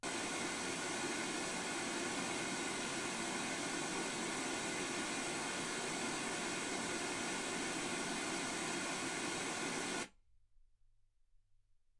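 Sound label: white gaussian noise 48kHz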